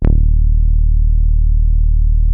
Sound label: TAURUS E2, synthesizer; Hollywood Edge - Giorgio Moroder Rare Synthesizer Collection